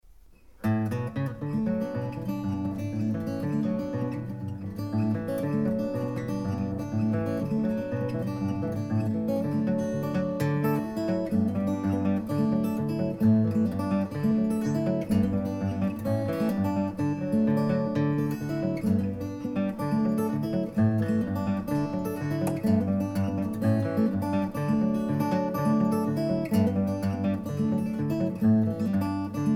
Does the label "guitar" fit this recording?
Yes